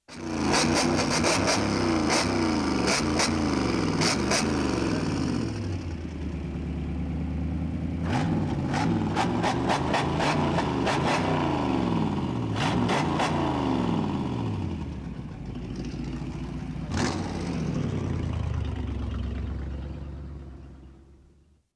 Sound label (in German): frisierter 1939er cadillac; Sport